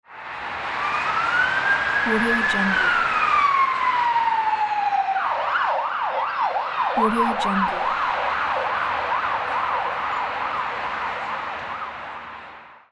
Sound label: ambulance siren